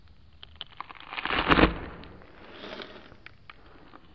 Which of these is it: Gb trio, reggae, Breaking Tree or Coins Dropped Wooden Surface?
Breaking Tree